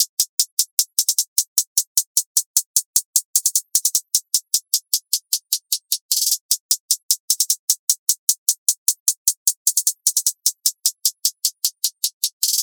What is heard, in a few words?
hats 152, Hat Loops; drum sample pack; SEISMIC; Slate